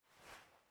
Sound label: Open Eyes; Mita; MiSide